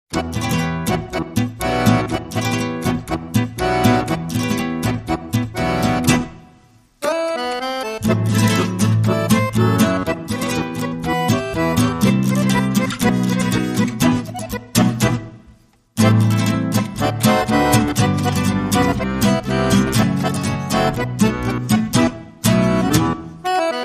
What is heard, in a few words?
Guitars and Accordion